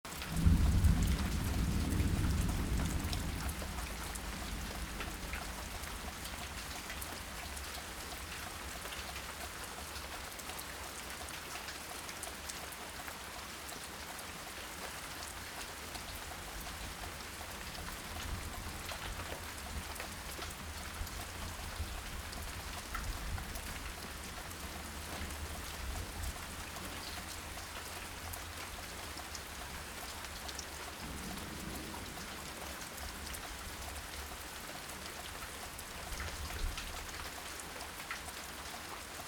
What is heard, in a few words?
thunderstorm